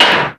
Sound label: SLAM, ELEC PRCS; East West; Global Sounds